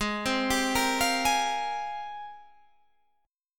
G sharp Major 13th, Guitar, Standard; G#M13 arpeggiated

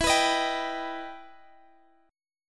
E Minor Major 7th, Guitar, Half Step Down

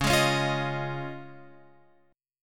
C sharp Major Add 9th C sharp, Guitar Open F; strummed; chord; x,8,8,8,8,10; JGuitar

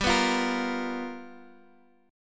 G-7th Sharp 9th-G-12,11,13,13 down, chord

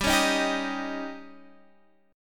C sharp Suspended 2nd Flat 5th G, chord

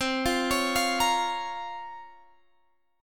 Bb Minor C, Bbm/C; Bbm/C arpeggiated

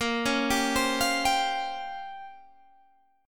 Bb Minor 6th Add 9th Bb down Guitar Open G

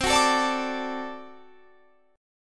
D Major C, guitar

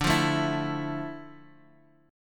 D Minor Add 9th; Dmadd9; strummed; JGuitar

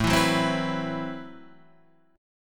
A Minor 6th Add 9th A, Chord; Am6add9 Chord {5 7 4 5 5 7} strummed

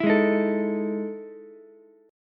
E Major 11th E down Ukulele C6; strummed; JGuitar

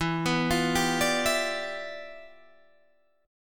E Minor 7th Flat 9th E

